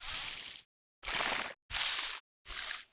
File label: Spider Coldweave Spider Attack Vocals